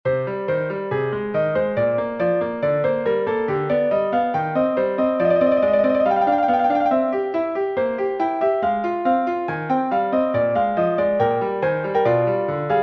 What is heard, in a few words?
Cadenza